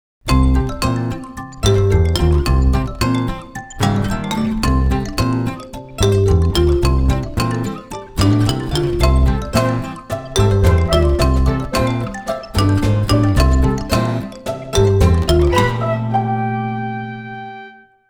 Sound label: marimba; Tchoupi; T'choupi (Générique et bande originale du dessin animé)